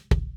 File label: Kick In